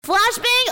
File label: flashbang